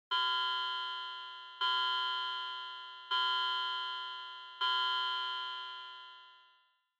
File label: Wall Clock Time Signal